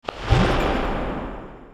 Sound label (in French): bombe, bruitages